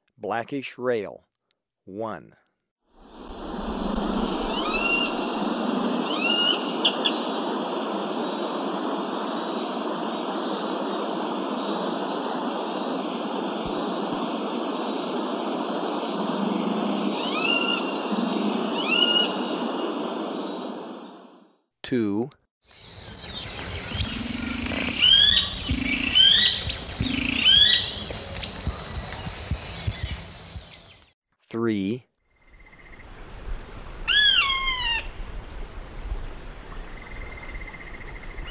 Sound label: Blackish Rail; S. America